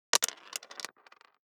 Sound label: DICE on Plastic, Throw and Roll, Standard, 2 Two Dice; Samples; ArticulatedSounds; DICE Sfx Party (Extended)